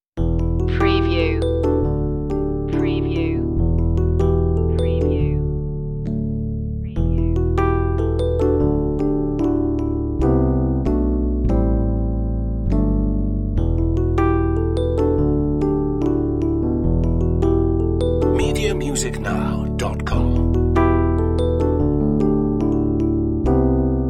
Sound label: capture the moment no piano